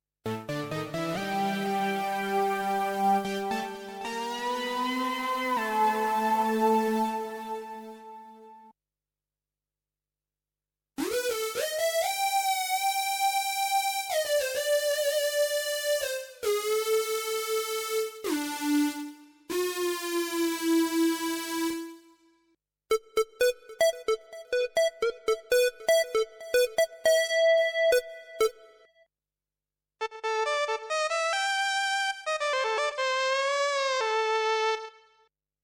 Synth Lead; MOX6

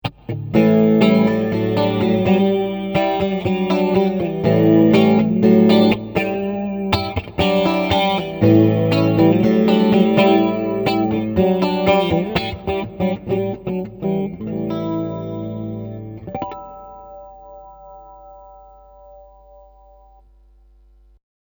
Neck Bridge Clean

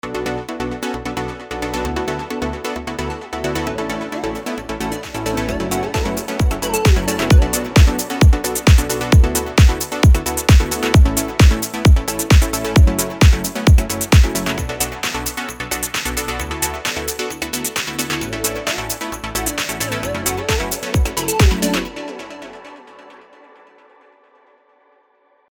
Melodrift; Vengeance Sound Avenger 2; Factory 2

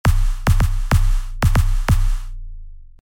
Power Kick

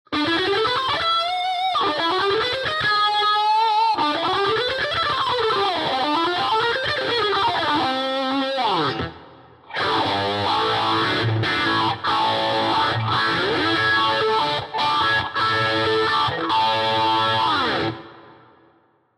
Lead Tone

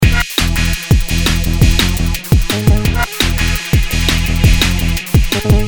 breakbeat auto bass mline

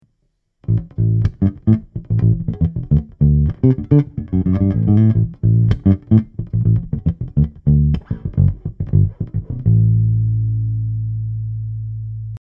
Markbass MB58R CMD 102 Pure Fingerstyle clean Mic

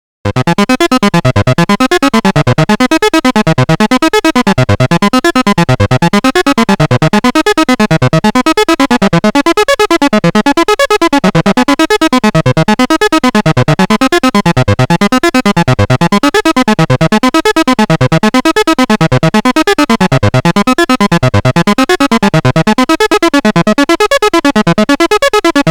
ARP Avatar FM Cross Mod via WHEEL

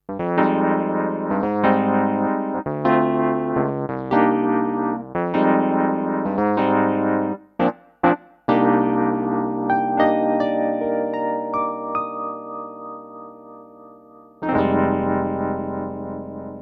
Vintage E Piano

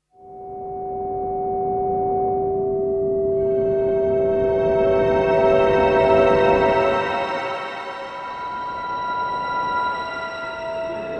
Strymon Big Sky Shimmer Synth

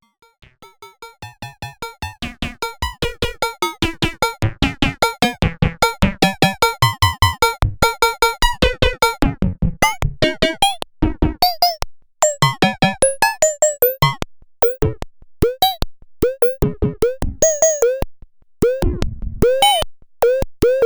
Bleeps Blobs

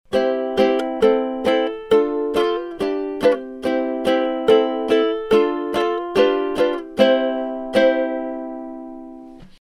Ukulele; AKG C3000

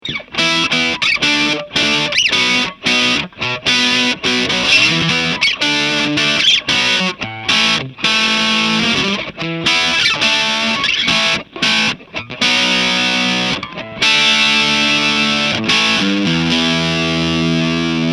full gain

